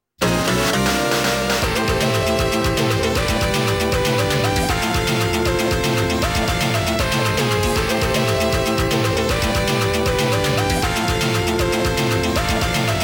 Ranking BGM

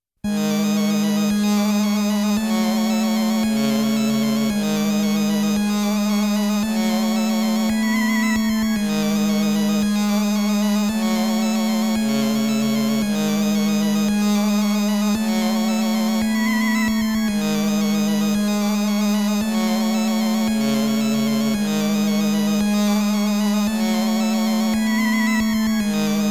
Underground BGM

